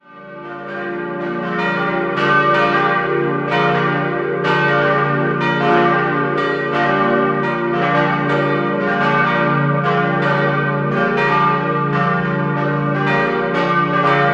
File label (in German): Büchenbach, Glocken